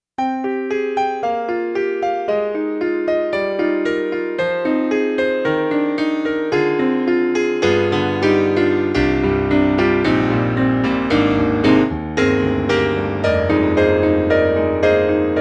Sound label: Kurzweil MicroEnsemble ME-1 Hard Rock Piano